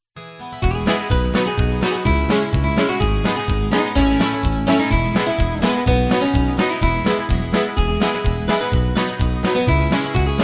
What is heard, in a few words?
music